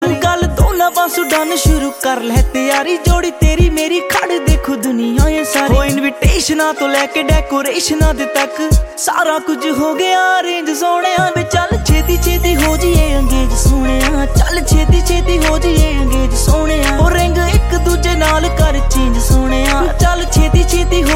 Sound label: Punjabi